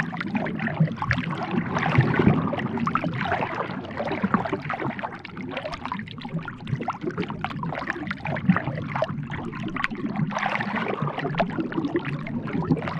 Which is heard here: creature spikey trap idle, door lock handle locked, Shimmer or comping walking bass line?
creature spikey trap idle